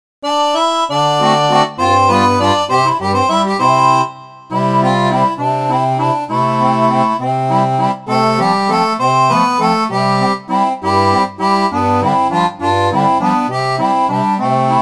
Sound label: seraphone